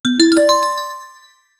game sound effect buildup

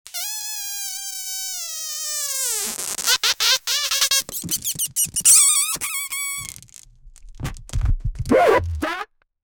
a funny cartoon style sound

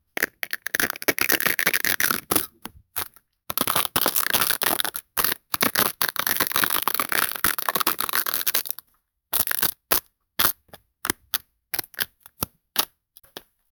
birds

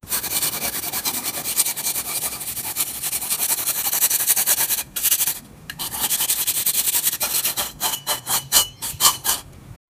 brush stroke painting sound

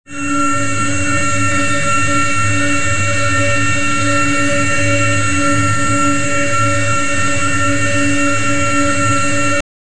sirens blaring; red strobe

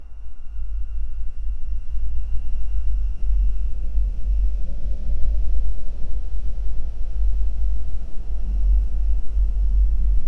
meditative rhythm

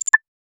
western ui menu transitio

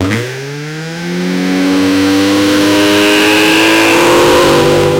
generate a short engine, redline

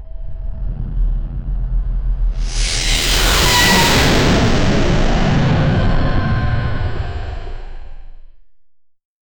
the air slowly tightens, roar

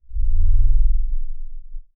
connected successful soun, bubble

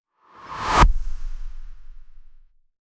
dark trap reverse impact

